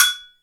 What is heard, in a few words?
agogo; PERCUSSION; Crypton - DrumBase5000; PERCUSSION#1